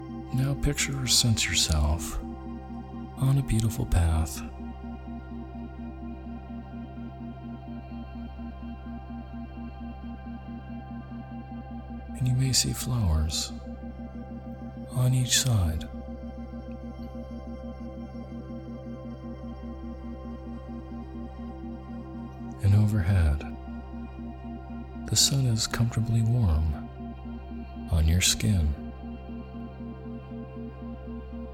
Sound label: Weight Loss With Isochronic Tones